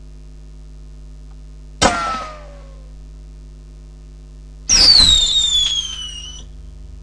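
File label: firework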